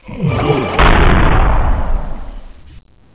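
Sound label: spider death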